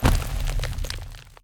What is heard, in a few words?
Player Falling Hit